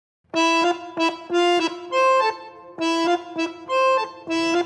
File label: bandoneon